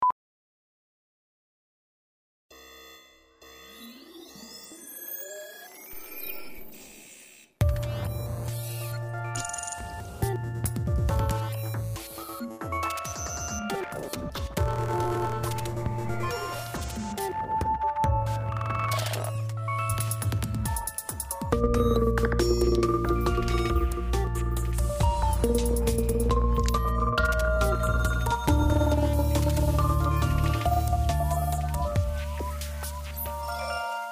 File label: robot skin tvc music; philips